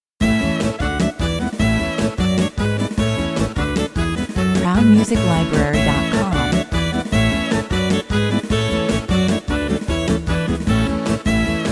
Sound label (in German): (sax) 152 bpm, Fröhlicher Latin-Pop